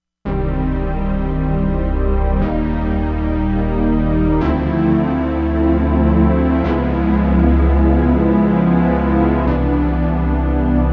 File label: Swell Pad, Korg MSC-07S Synth; Korg MSC-07S Synth 2